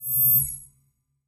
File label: space game menu subtle